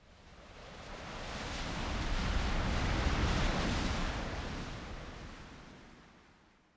wind buffet; ModernWarfare

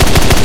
cannon 30mm mk103 loop; guns; War Thunder

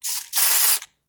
skinchange spraypaint; Rust

